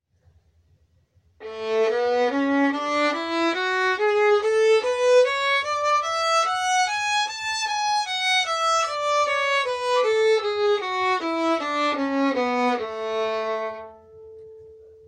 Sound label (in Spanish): Escala de la mayor en corcheas